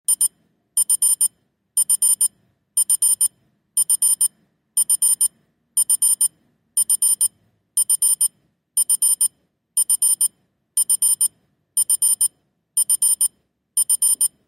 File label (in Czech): ukazka zvuku alarmu